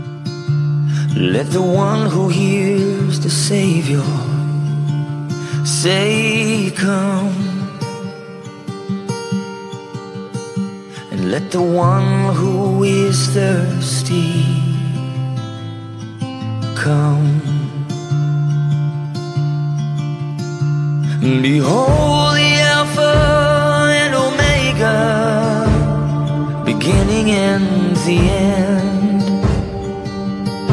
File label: MUSIC English